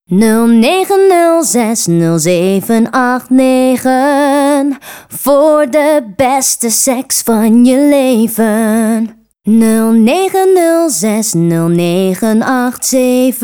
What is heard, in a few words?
Jingle